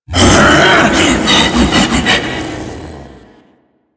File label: Ape Prelate Attack Long B; Enemies; Warframe; Proto Infested